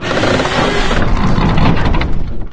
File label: dog straining; scmod; synthcrab